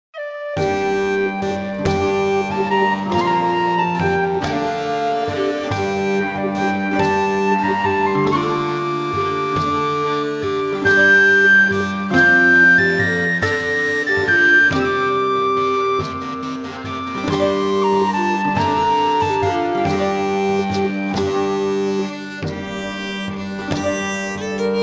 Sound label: Medieval Flute Song